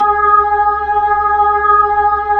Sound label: B-3, Jazz